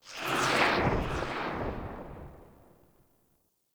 Sentient Start Move; Enemies; Sentient Movement

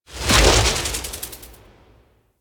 Jump Attack Start; Enemies; Warframe; Infested; ZealoidPrelate

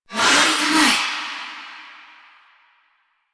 Omamori Charge; Warframe; Koumei

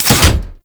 Door Open A; Warframe; Ambience; Grineer; Grn Door

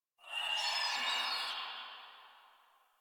Tree Distant Critters; Warframe; Duviri

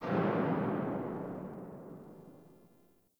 Under City Alley Cell Door Slam, Dungeons

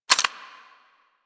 Rifle Mech Secondary; Weapons; Warframe; Tenno; Bard Rifle

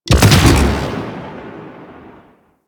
Mortar Launch; Warframe; Venus; Venus Terra Mortar Moa; Abilities

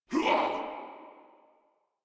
Pain, Grineer Male; Warframe

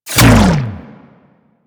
Bomb Puzzle Switch Off; Missions; Raid; Grn Bomb Objective

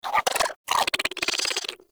Squid Plant Attack Vocal; Objects; Traps

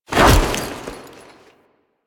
Foley Melee Sweep; Warframe; Kahl

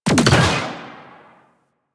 Attack Drone Rifle Fire; Warframe; Venus; Venus Terra Attack Drone; Weapons

